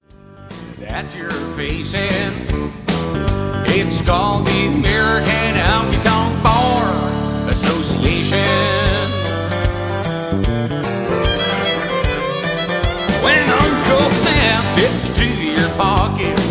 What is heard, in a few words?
American honky tonk